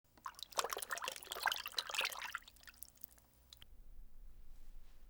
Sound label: Water Splashing; Fairlight Sound Lib